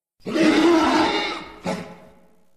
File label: angry grunt